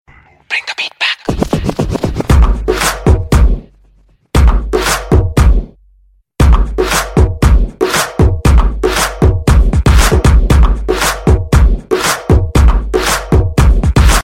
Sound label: solid hiphop